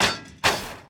damage vehicle; World Of Warships

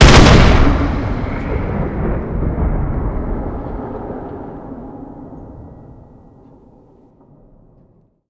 abrams 120mm, weapons; weap abrams120mm sweet

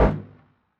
minigun fire end; Weapons; DCS

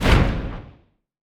depth bomb; World Of Warships

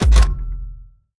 gun reload; World of Tanks

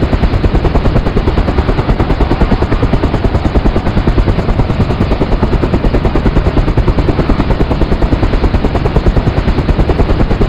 rotor AH64, aircraft engines; DCS

